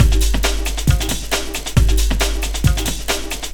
Break; Jungle; Breaks; Blu Mar Ten - Jungle Jungle - 1989 to 1999 Samplepack

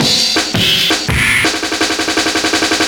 Arson Fill, 4A, Jungle Breaks; Blu Mar Ten - Jungle Jungle - 1989 to 1999 Samplepack

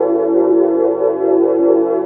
Pad, 11A; Jungle; Blu Mar Ten; Jungle - 1989 to 1999

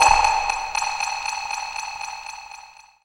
Submarine; Jungle; FX; Blu Mar Ten - Jungle Jungle - 1989 to 1999 Samplepack

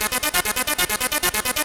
Sweet Arp - 1A, Jungle; Blu Mar Ten - Jungle Jungle - 1989 to 1999 Samplepack

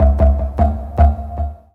Tones; Jungle; Riffs, Arps & Hits; Blu Mar Ten; Jungle - 1989 to 1999

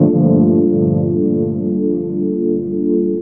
Chord; Blu Mar Ten - Jungle Jungle - 1989 to 1999 Samplepack

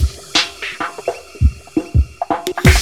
Possible Worlds Break, Jungle; Breaks; Blu Mar Ten - Jungle Jungle - 1989 to 1999 Samplepack

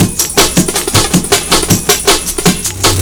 Heaven Break, Jungle; Breaks; Blu Mar Ten - Jungle Jungle - 1989 to 1999 Samplepack

